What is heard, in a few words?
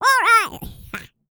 Vocal, R&B & Dance; ILIO; Vocal Planet VOL-4